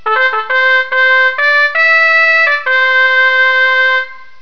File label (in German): TRAUER